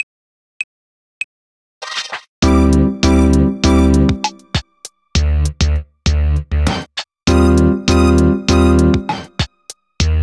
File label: unused techno music